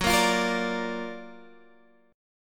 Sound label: F sharp Augmented Major 7th F sharp Guitar Half Step Down